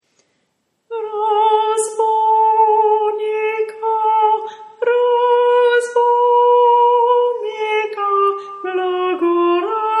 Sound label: razboinica baryton